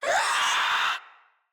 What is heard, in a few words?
Infested Crawler Attack Short; Crawlers